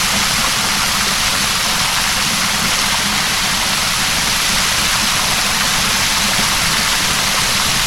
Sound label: hose